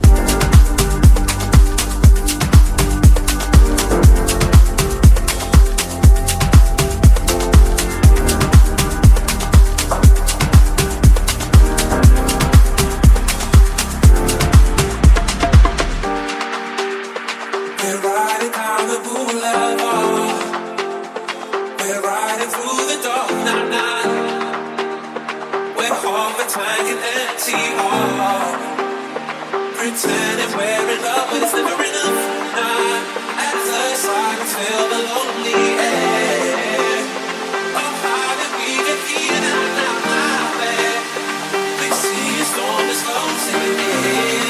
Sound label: Afro House Remix